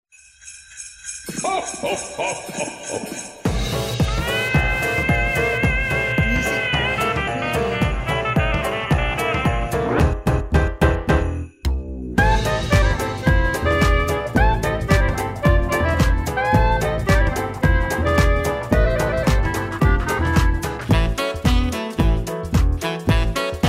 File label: Jingle Bells Elettro Swing